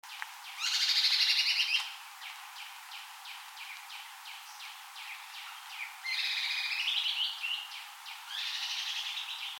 Common Woodshrike Tephrodornis pondicerianus Urocissa erythroryncha